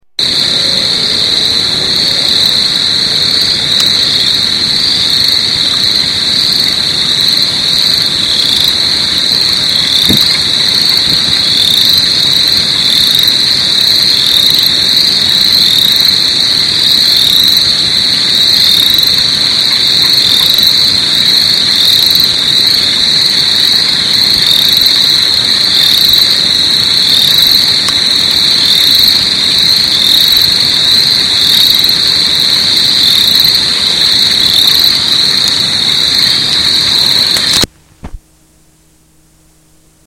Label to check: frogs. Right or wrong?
Right